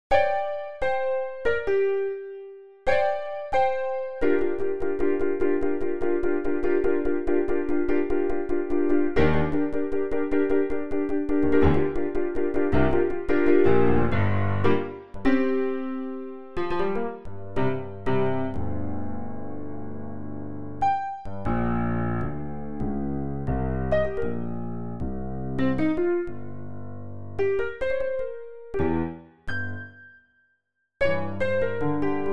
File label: pc3 piano digi